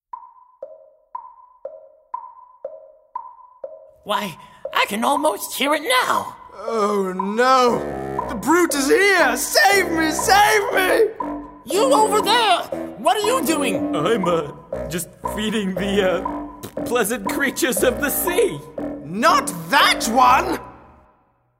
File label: crocodile entrance